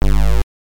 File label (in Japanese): カプセル取得; Arkanoid; PC-88